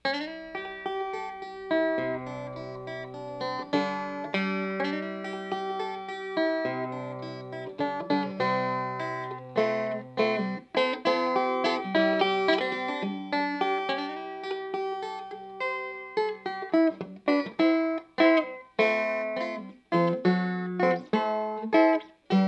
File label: soundhole